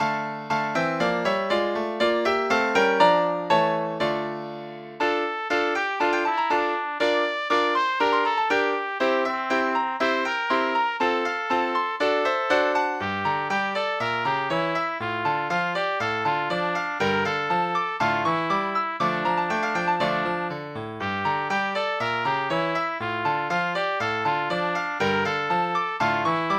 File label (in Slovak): pre trúbku a klavír; Darmo ma, mamička